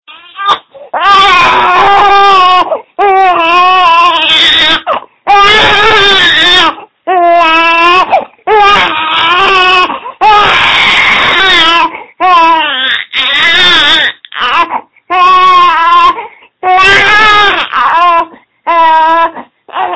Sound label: hungry cry